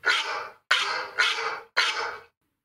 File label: IPhone 12 Bark IOS14